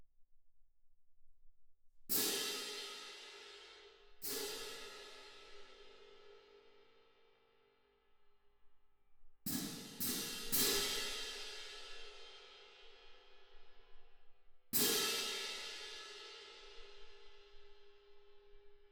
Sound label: Twin R Perc